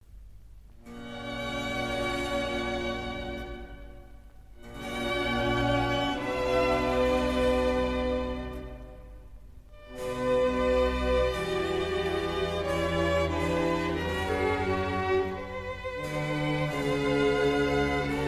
Concerto for 2 Oboes and Trumpet in D Major, Adagio; TWV 53 D2; Telemann; Saar Chamber Orchestra